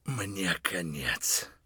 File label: hurt near death; Escape from Tarkov